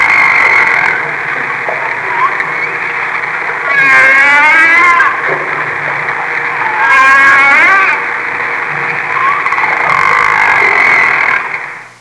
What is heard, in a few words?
baleen whale sounds